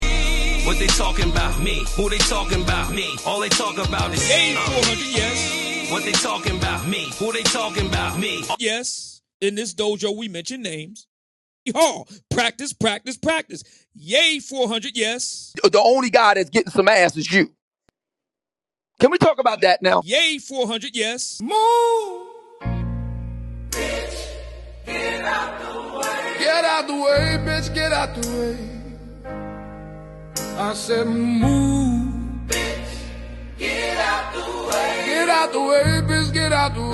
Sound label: jingle